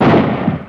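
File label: rifle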